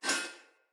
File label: Split Sword Accent; Weapons; Player; Swords; Starter